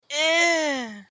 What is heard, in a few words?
ehh